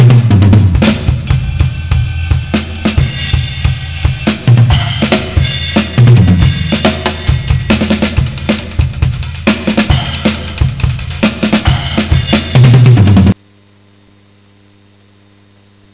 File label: drums